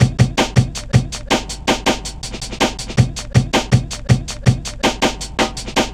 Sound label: helicopta, drum loops; Zero-G Jungle Warfare 1, 2 & 3